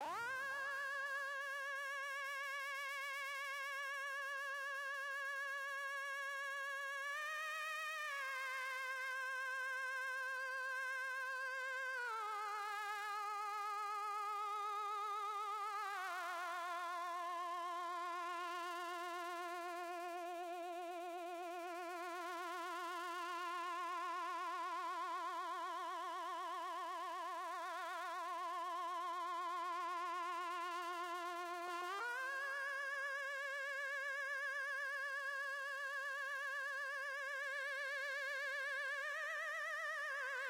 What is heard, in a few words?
LEAD